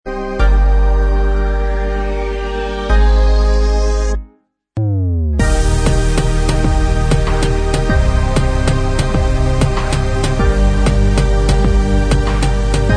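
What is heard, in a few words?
instrumental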